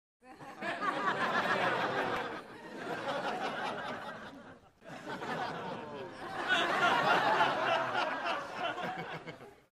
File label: Crowd 100 Short Medium, medium int